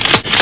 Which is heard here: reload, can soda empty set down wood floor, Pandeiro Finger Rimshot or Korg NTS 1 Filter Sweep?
reload